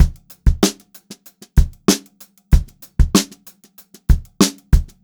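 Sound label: STRAIGHT VARIATION, Funk-Groove Drumloops; USB Soundscan vol.38; AKAI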